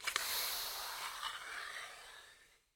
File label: Door Pneuma Down Long; Puddle; puddle plus ios gamerip 2018